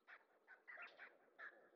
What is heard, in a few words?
monkeys; ambient soundscapes; dota